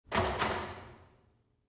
Metal Door Push Bar O, push bar

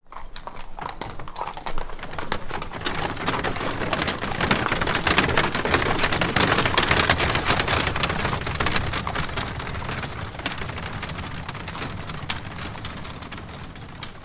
Carriage Start Away, carriages

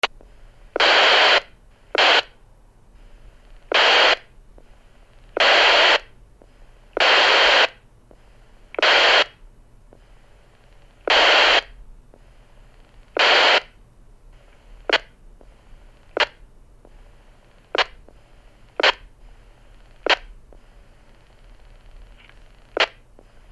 Static; Walkie-talkie

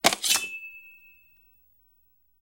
Knife Draws, knives

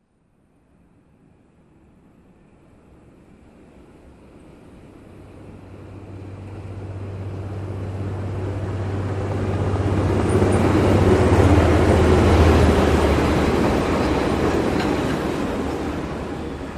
Train By Smooth Elect, monorail

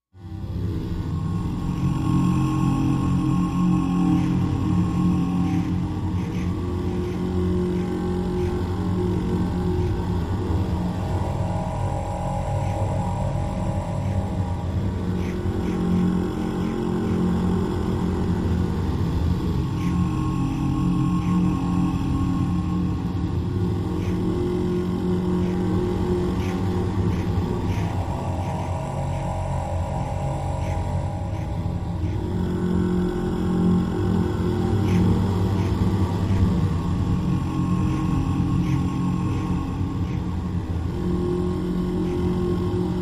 Drone Fear Low Drone; eerie ambience

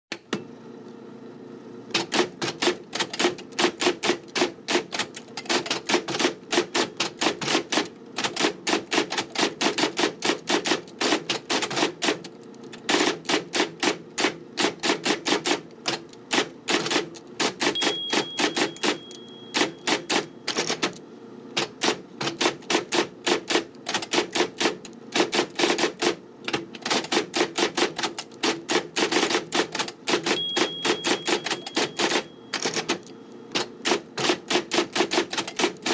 Modern Electric Typewriter; typewriters electric

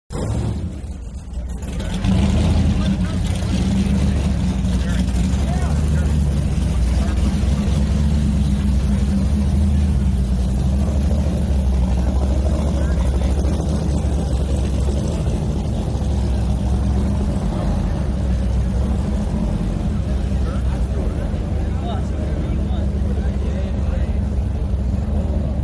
Power Boats Pre Race, off shore power boat

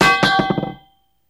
Pipe Drop Bounce

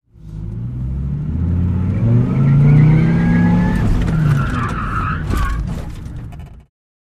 Accelerate Quickly, sports car

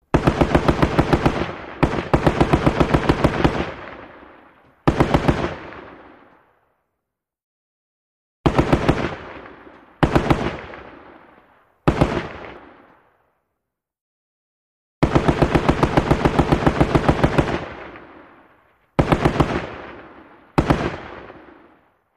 50 Cal Long Bursts Ste

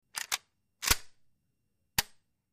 Big Shotgun Pump Dry; mvmt

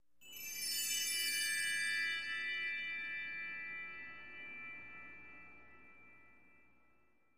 Music Trans Bell Tre, chimes

